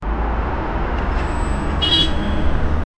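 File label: urban distant traffic ambience; approaching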